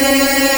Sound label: Blaster Flight; chicken gun; iOS, Android